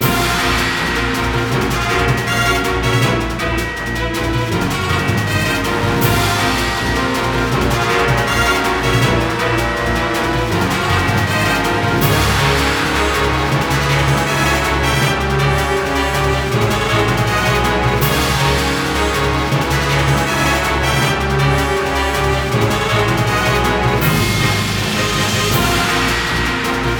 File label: battle; tukito; srpg